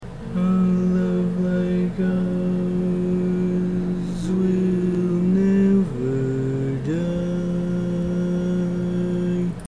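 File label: Baritone, Barbershop Tags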